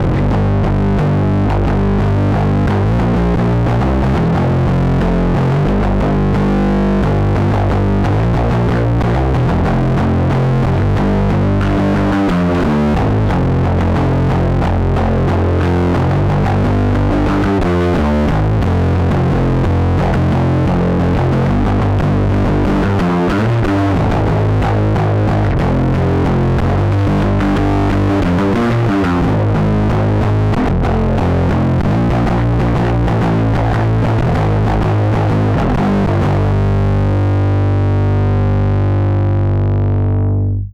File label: bassement plague bass, distortion